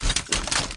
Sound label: gun click; gina